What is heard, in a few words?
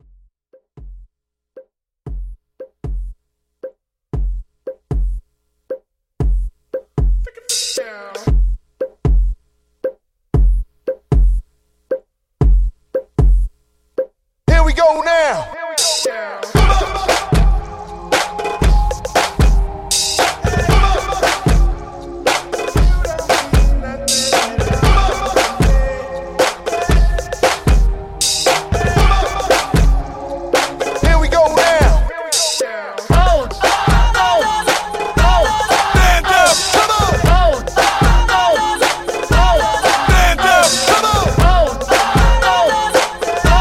Intro Outro Hype 116Bpm